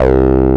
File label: TB 303